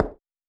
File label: High Heel